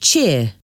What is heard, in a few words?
cheer